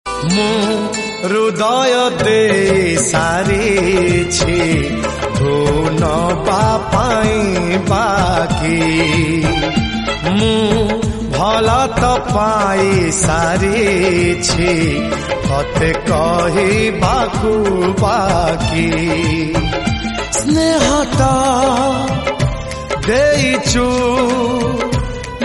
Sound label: oriya song, ringtone